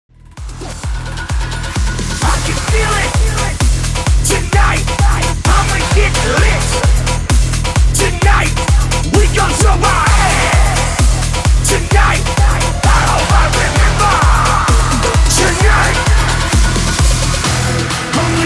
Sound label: HYPE 130 BPM